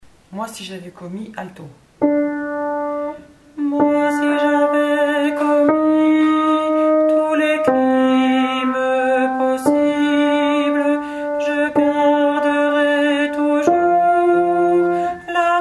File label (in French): ALTO; Moi si javais commis offertoire communion